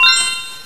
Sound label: Bonus Card; MexicanaChillitime; ClubFusion